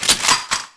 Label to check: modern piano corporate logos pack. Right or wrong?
Wrong